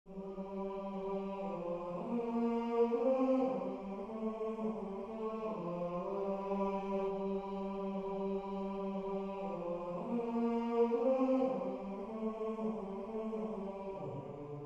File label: Bass